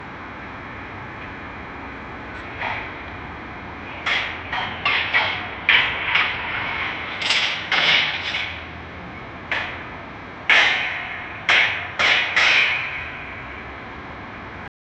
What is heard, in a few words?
building destroying becau, collapse